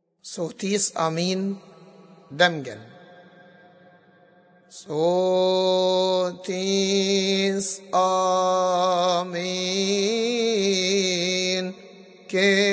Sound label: Hymns Amen; Saved Amen Sotees Amen